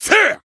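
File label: attack, Japanese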